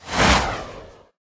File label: Rocket Attack Missile; Warmonger